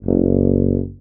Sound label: Contrabass Tuba Straight F#0; DirectWave; Varazdin Orchestral